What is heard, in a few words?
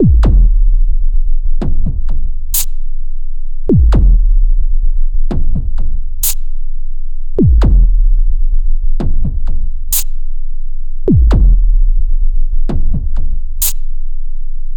Drum